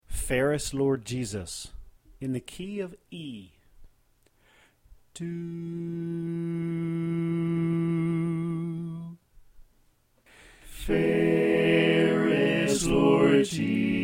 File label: Bass Left